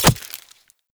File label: Throwing Knives Impact Vegetation; Weapon Impacts; Player; Environment